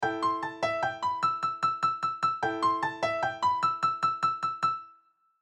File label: piano